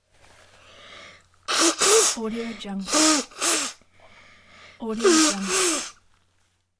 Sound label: female blowing nose